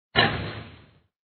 hit hoop, Basketball; Sports Games; BasketballPractice

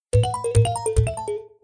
mistake game; MazeAndTourist; Kids Games

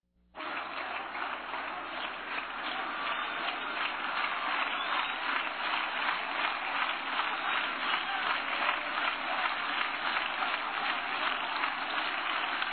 crowd ambience; Sports Games; BaseballClassic

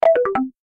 turn; CasinoCardsMemory

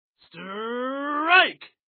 strike; BaseballClassic

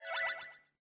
add fuel; CrazyDriver; Action Games

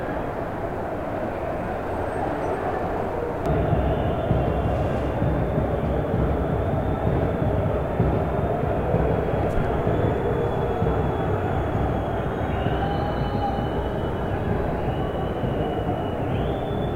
fans stadium loop; Kids Games; DribbleKingsFootball

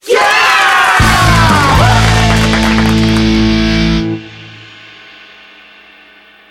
arrival win; Karting

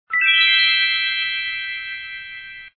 coin

